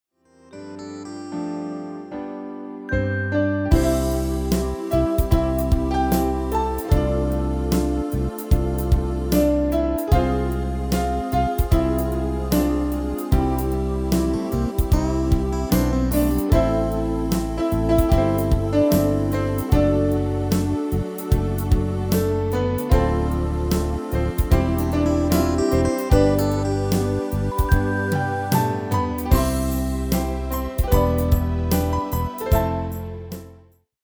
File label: MIDI